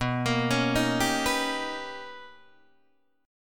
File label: B Augmented Major 9th B Guitar Open D